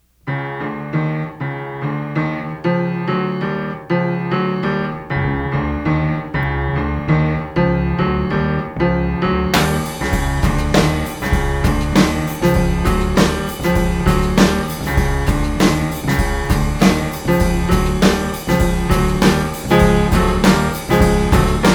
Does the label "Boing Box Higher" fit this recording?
No